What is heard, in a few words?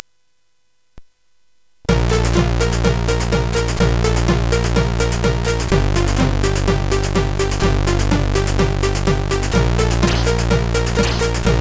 Intro, CSG8580R5; X-ample; hvsc